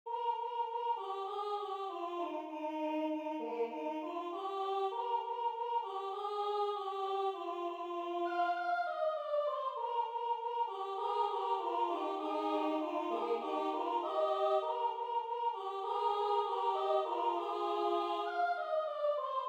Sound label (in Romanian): colinde, Ardeal; Romania; Si am plecat sa colindam